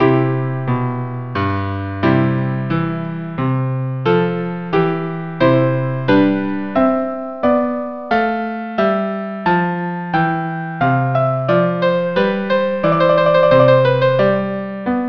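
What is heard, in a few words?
Saul andante violin cello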